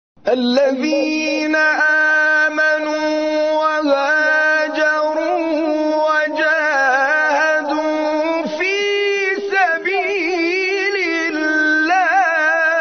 تلاوت آیه سوره توبه; آیه 20

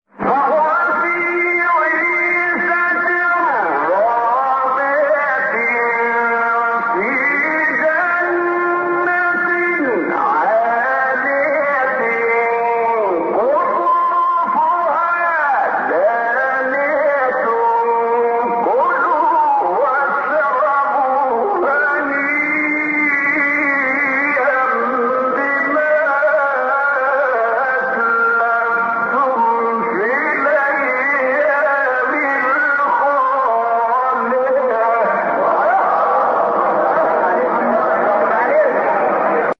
تلاوت آیه سوره; استاد طبلاوی; 21 24; حاقه

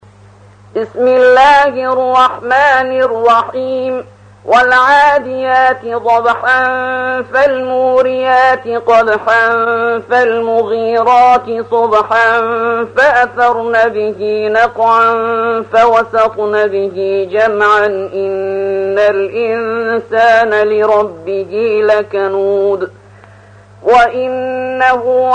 ترتیل سوره عادیات

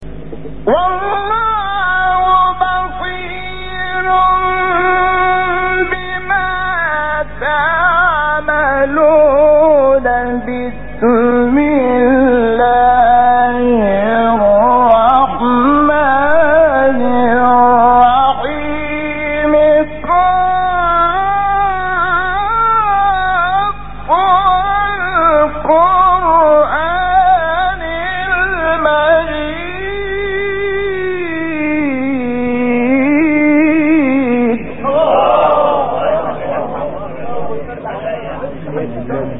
فراز زیبای سوره مبارکه; ق; شحات محمد انور

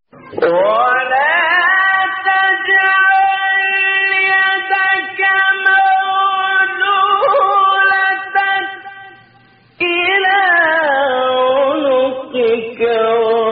فراز زیبای سوره اسرا; استاد محمد اللیثی

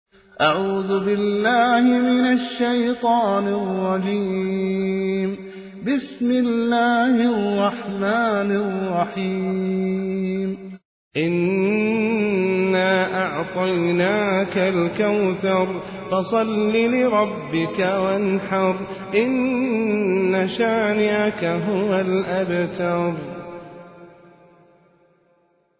سوره کوثر; العصیمی

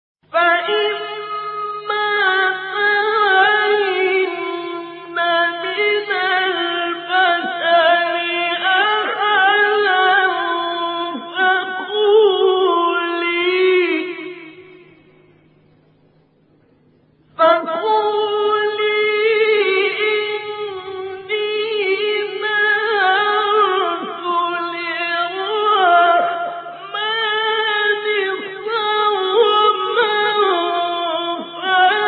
تلاوت زیبای آیه سوره مریم; آیه 26; استاد ابوالعینین شعیشع

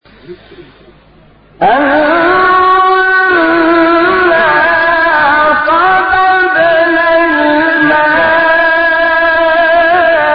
تلاوت ملکوتی از سوره; عبس; شحات محمد انور

